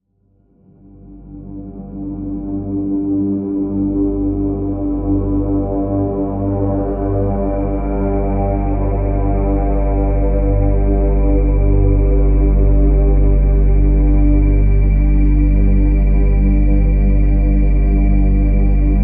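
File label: Drone G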